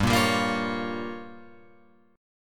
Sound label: G Minor 7th Flat 5th, Guitar Open F